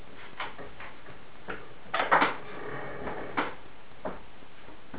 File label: Windmill strange sound moving furniture